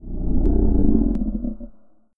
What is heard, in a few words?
tree moan; Brightwood Guardian